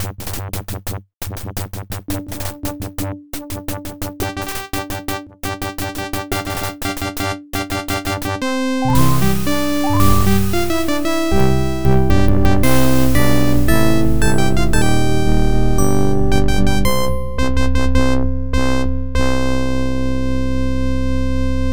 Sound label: Time Warp Success; Space Quest IV Roger Wilco and the Time Rippers; IBM PC AT